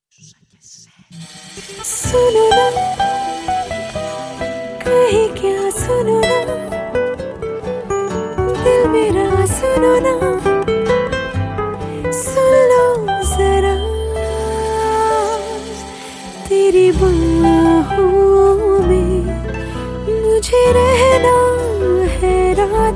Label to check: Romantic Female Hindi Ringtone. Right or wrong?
Right